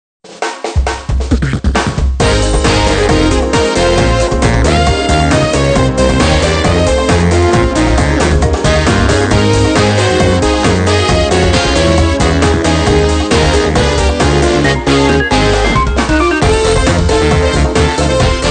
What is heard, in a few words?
Music Success event